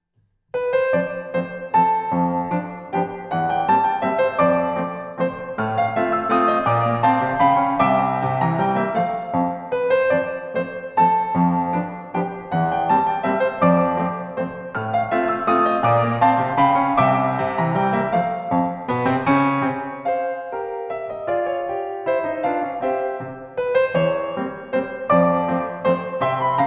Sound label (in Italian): Valzer pianoforte; von J. F. Limmer